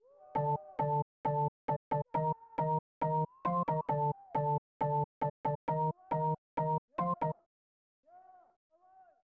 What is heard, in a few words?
Organ Music; Troy Aikman NFL Football; SNES